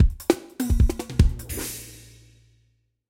music main game start; serengetikings

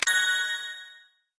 generic button; koi princess

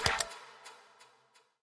aloha Spin Button Click

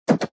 button; mega joker

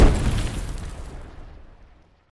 block explode; pyramid

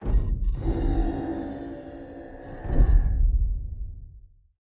win display; rise of maya

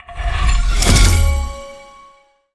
bonus turn; silverback

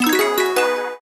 super Meter Joker Win; mega joker

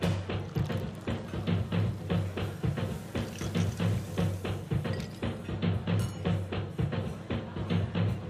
ambience Free Spin; piggy riches

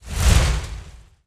fire Burst Close; willyshotchillies

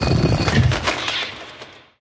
bonus Miss; bloodsuckers

